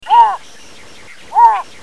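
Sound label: yosigoi, falcated duck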